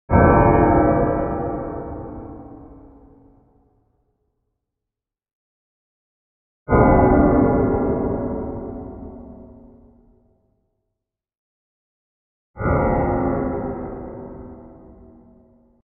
Eerie Bell with Descending Tail x3; Hollywood Edge Sound Designers Tool Kit

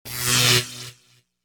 Electrical Buzz; DJ sounds

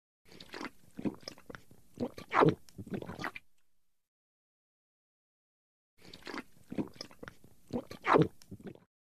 Human Beer Gulp; Hollywood Edge Foley Sound Library

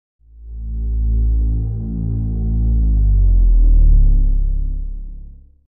Sub woofer - Deep synth slowdown; Hollywood Edge Sound Designers Tool Kit

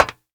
metal, footsteps; 1000fx

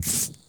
bottle pop, kitchen; 1000fx

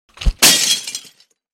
Numchuck Breaks Glass; Hollywood Edge Martial Arts and Human Impacts

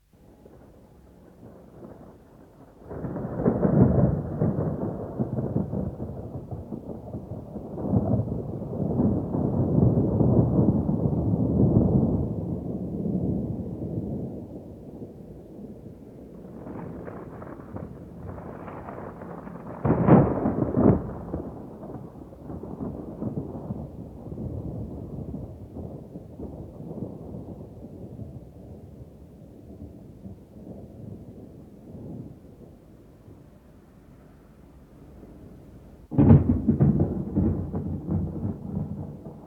Distant Thunder; Dr. Sound Effects; 210 Nature Sounds - 20 Hours of Relaxing Natural Ambiences for Meditation and Sleep

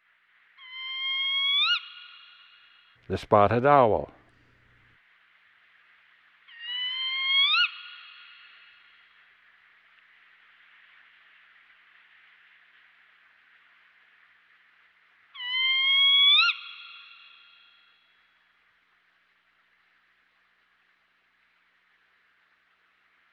Spotted Owl; Western North America; Dan Gibson; Wildlife Identification By Sound

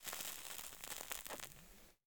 Cigarette Burn Crackly CU; Foley - Props; Foley Essentials

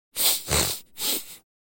Sniff Three Sniffs w a Snort, Human Sounds

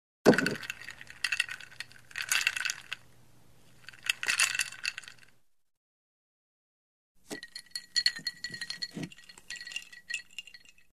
Ice Clinks In Drink; Hollywood Edge Foley Sound Library

